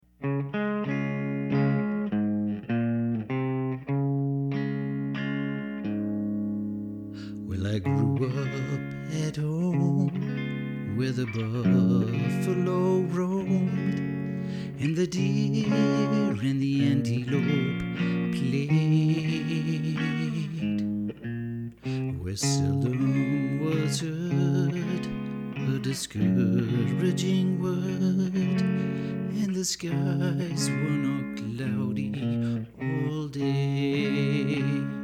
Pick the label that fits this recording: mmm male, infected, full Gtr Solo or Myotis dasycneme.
full Gtr Solo